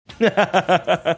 chuckling

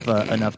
snorting

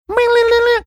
making guitar lick sounds

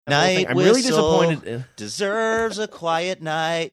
singing night whistle deserves a quiet night

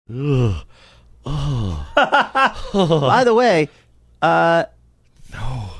making disgusted sounds saying no

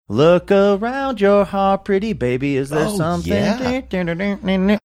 singing portion of

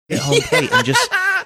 funny laugh

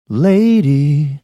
singing lady

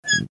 squeaking sound

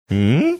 curious sound

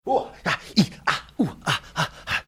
making sounds of handling something hot in hands

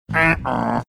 imitating uh oh drop

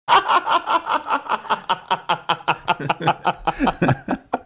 extended laughter

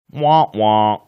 wah wah

